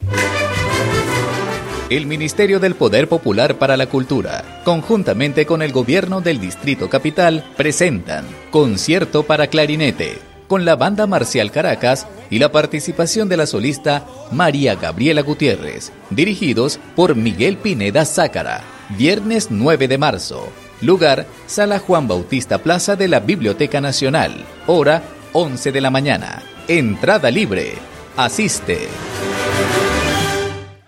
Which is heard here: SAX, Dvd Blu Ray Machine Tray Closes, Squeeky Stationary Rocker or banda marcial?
banda marcial